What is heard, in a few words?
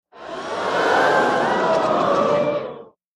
Theater Crowd Gasp Oo